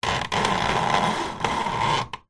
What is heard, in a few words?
household blind venetian cord pull close up creak